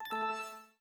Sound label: Web Page Loaded; finn's sounds